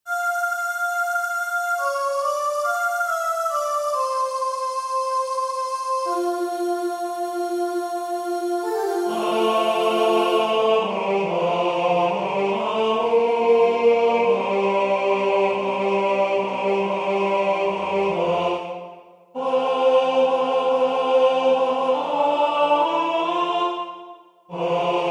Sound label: Tenor; W.A.Mozart; Laudate dominum